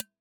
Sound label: ALUMIN STEAM XL, Household; Convolution; NI Kontakt 5